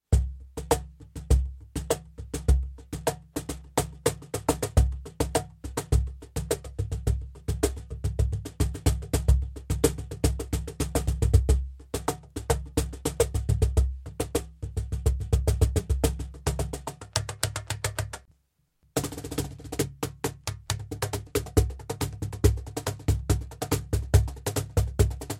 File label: tres lados cajon